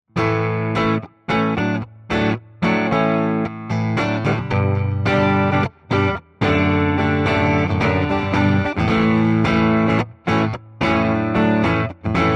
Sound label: Audified ampLion 2 Clean Reverb; Bartender